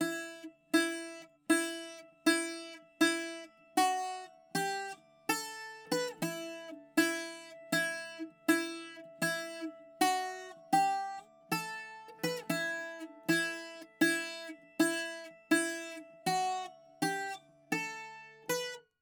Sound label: bouzouki